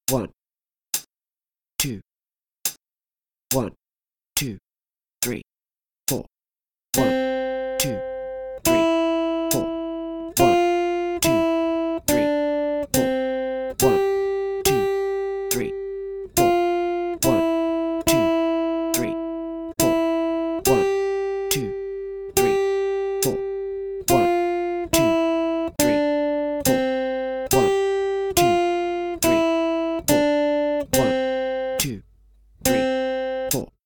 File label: 3 strings, guitar